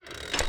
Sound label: lever Sound